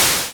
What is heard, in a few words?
ring